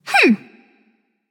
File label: tongue, voicepack; smite; pele